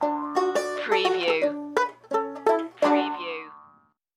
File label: party barn banjo stinger